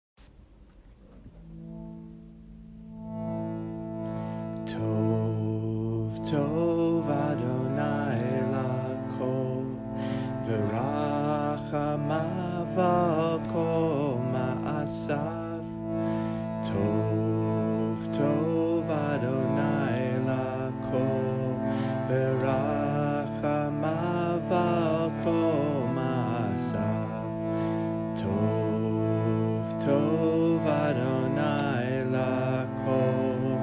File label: tet chant